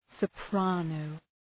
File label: soprano